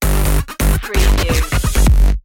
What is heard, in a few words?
jackhammer stinger